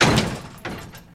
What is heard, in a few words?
Jeep Crash Brief, car crashes